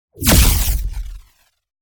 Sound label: Powerful energy blast shockwave